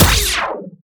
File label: Sciweap Shot Small Laser Punchy Needler